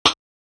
Hats, Alesis Performance-Pad

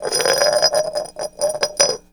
JAR SPIN; Rarefaction; Poke in The Ear With A Sharp Stick

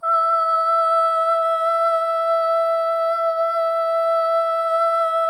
solo boy; Spectrasonics; Symphony of Voices

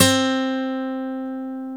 A GT C3; AKAI S-Series CD-ROM Sound Library VOL-7; A GUITAR 1

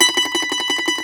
MAND TRM, Mandolin; E-MU Producer Series Vol. 7 – Old World Instruments

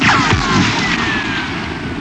WOOD LSR; STARS FXS; East West; Global Sounds

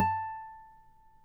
NYL A, FLAMENCO NYL; Spectrasonics; Hans Zimmer Guitars VOL-2

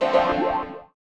chords

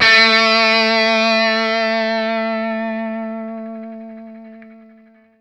SINGLE A, Vintage Blues Guitar, ELEC.GTR; USB Soundscan vol.22

